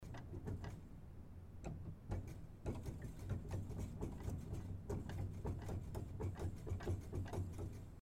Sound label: Silent Keys, Piano